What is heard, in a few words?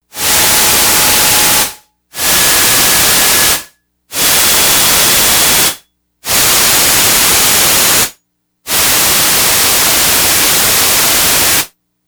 PINK NOISE, Yamaha DX100; Factory Patches